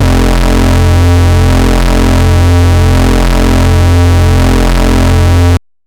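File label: bass sustain F; Polyend; ARC Noise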